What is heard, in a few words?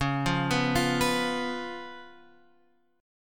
Db 7th Db down Guitar Open F; Db7 arpeggiated